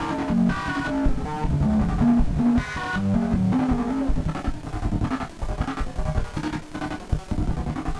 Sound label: Battered Synth; malfunctioning synth samples